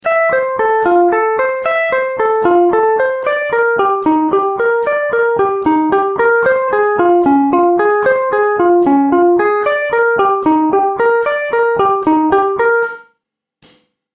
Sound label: switching string guitar exercise